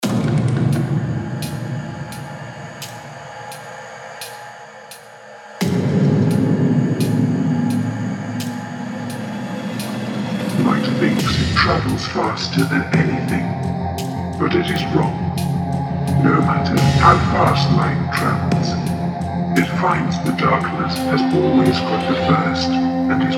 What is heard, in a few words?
Foley; BHK Samples